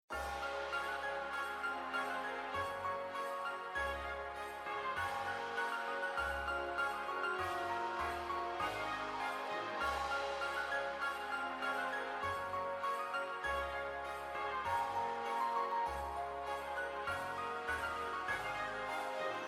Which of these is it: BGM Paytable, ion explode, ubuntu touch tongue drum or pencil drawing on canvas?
BGM Paytable